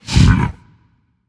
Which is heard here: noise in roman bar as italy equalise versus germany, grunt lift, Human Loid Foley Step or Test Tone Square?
grunt lift